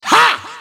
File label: ha